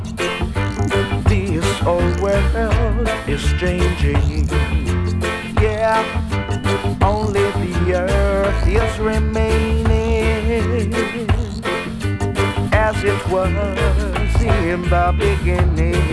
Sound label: reggae